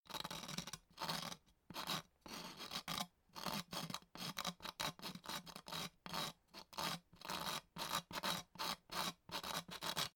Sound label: scratching